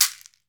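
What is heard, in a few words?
large CAXIXI, Latin Shakers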